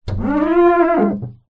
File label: household mirror rub fingers squeak